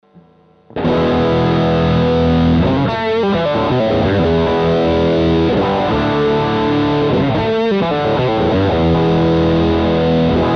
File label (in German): Mode III Gain 12 Uhr Voltage Test; EONS